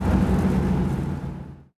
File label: engines; World of Tanks